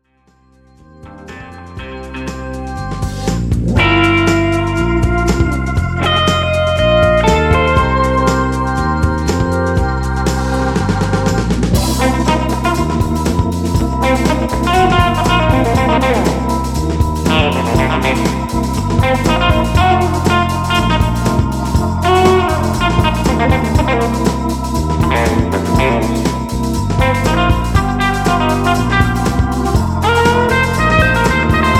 Blue Guitar More Gain Long

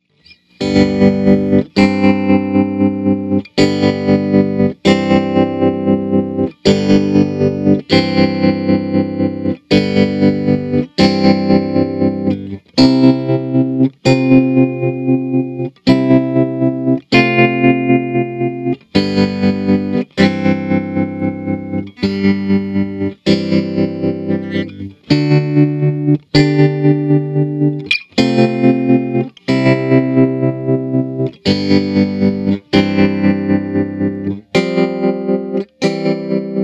chords medium

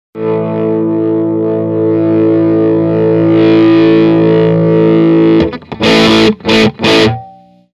swells full gain

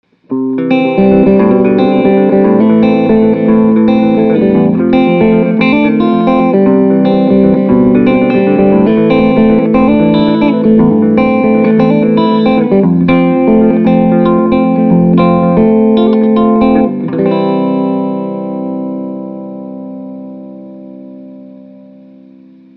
Fast Moderate

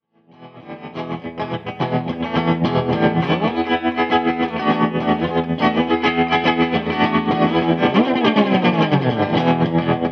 trm high speed edge

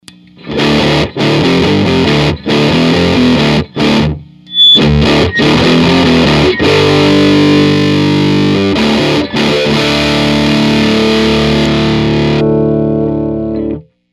drop d tuning

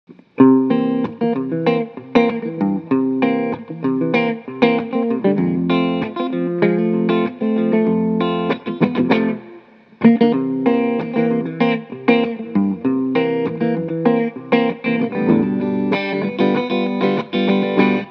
E4 Slow Heavy

